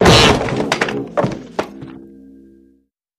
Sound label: Rude Boing Collaps; boings